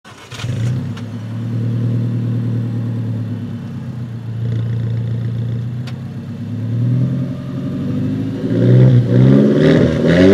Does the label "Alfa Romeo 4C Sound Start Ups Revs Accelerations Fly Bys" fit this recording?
Yes